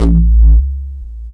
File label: techno, BASS; Techno Trance Essentials